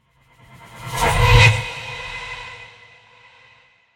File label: Magic spell whoosh